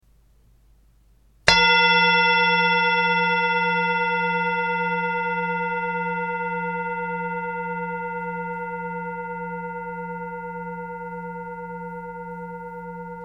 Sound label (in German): Klangschale